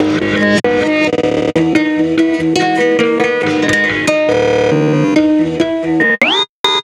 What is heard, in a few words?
guitar